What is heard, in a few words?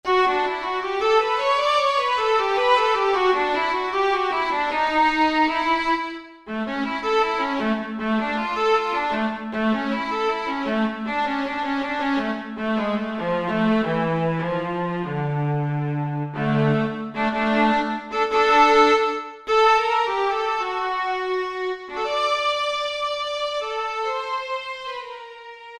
Stereo Viola